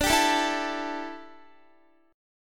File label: D Suspended 2nd Suspended 4th D down Guitar